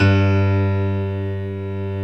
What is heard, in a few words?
DIG ACU PIANO; OMI - Universe of Sounds